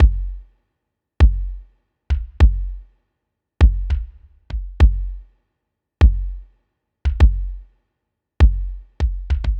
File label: 100 kicks; Low Tide; Ambient Piano Loops; C and Dm Night Mood